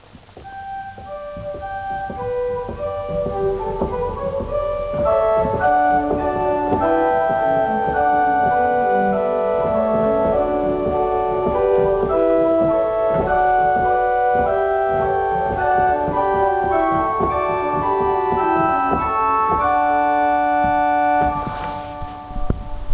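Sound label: Quinte, organ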